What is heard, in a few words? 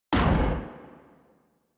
Pulse Laser Mech; Railjack